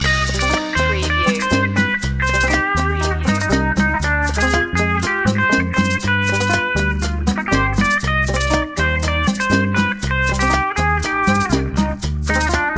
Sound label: bolero loop